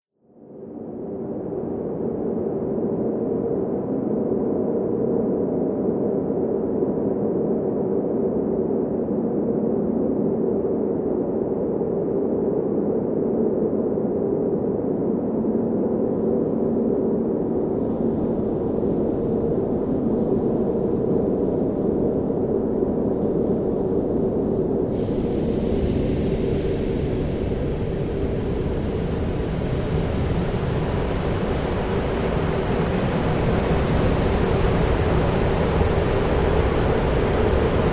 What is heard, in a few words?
Heavy Airy Wash Amb